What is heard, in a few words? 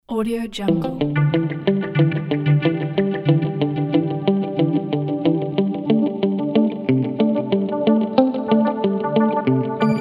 joyful holistic background